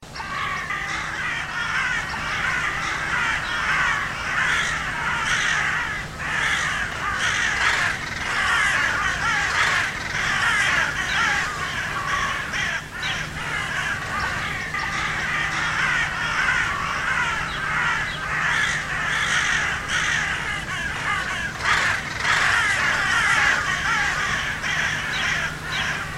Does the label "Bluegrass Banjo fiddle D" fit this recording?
No